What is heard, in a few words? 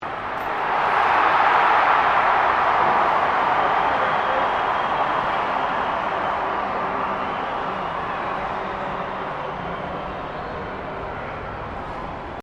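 crowd 15K Rugby World Cup cheer medium, Rugby world cup game; Crowd Sound Effects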